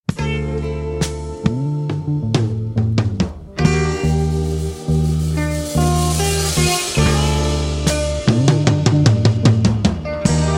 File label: funky Korean soul